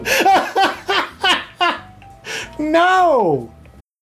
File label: laugh; taunts; hunters